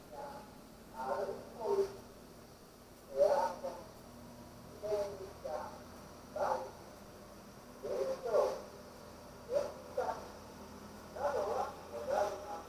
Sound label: aircon; yokohama; kadenrecycle